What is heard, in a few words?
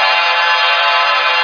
Non-Pitch C3, B3; SoundFont - The Developer's Choice - Volume 1